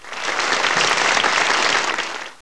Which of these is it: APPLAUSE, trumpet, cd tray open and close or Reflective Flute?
APPLAUSE